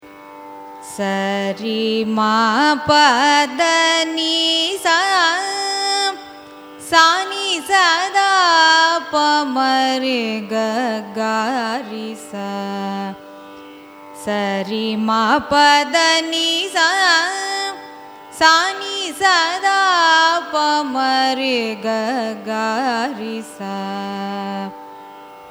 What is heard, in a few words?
raga darbar arohanam avarohanam; carnatic music